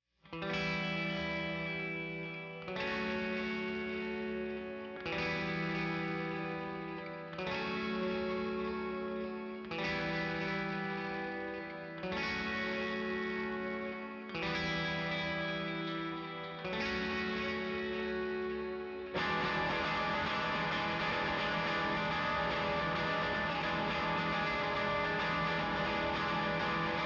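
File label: guitars; stems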